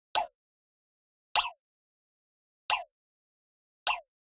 Generic Click Digital

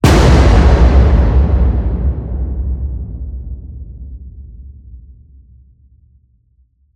HIT Slam, Cinematic Hits Designed

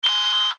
camera rotation; Code7

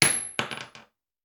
Bullet Shell; Sound Ideas; Guns

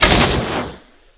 Crossbow Magic Shot; Weapons

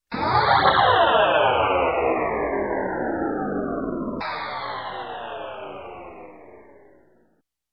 Space Double Space Fade, Electronic; Sound Ideas 2000 Series

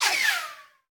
Bullet Pass By; Sound Ideas; Guns

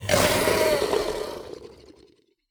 Zomby Dead; Blind Survivor

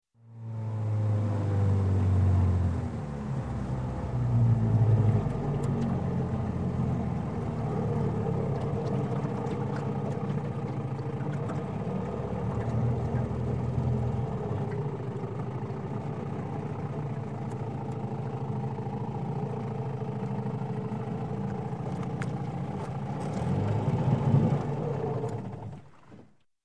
Boat Outboard, 50 Hp, Drive Along, Up To Dock; Shut Off; Sound Ideas; Series 2000

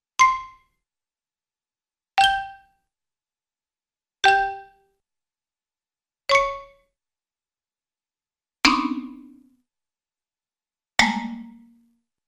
Xylophone Hits; Cartoon; Hollywood Edge; Cartoon Trax

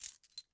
keys step low; DeadFright

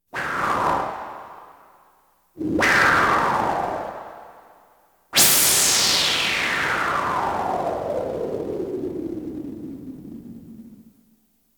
Science Fiction effects, Lasers, Light beams

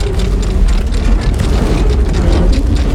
Drawbridge Loop; Doors

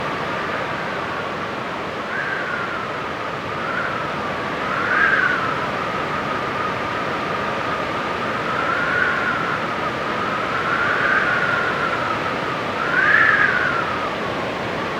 cold wind; firefight

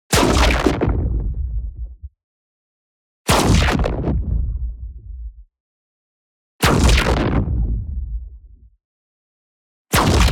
EXPLOSION LARGE Distorted Space Tear; boom library; Matter

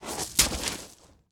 Bodyfall Gravel; SFX Kit; Bodyfalls

